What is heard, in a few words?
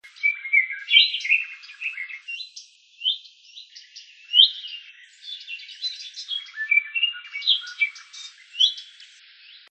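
slavka sadovaya, garden warbler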